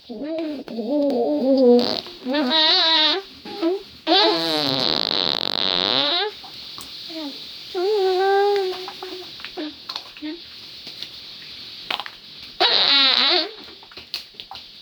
the girl poops and farts

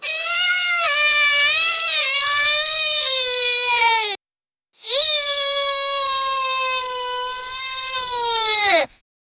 royal enfield bike drivin

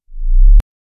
quick dashing sound for i, bassy

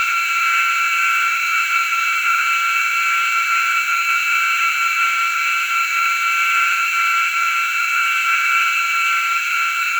soft muffled asmr texture

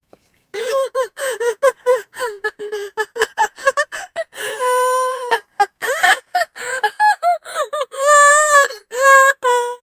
crying, tearful

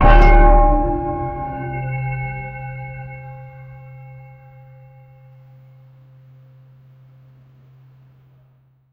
several deep bell strikes

